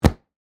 Punch Boxing, Sports, Punch, Boxing, Training, Glove, Hit; BlastWave